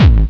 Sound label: HCORE EURO, Drums; Distored Reality 1&2